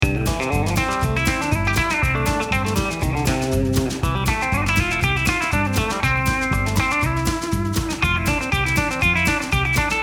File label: CLEAN BAND